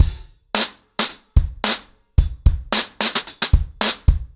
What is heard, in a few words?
110BPM, ACOUSTIC DRUMS; The Roots Drumloops